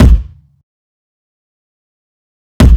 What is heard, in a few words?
Kick, Kick Loops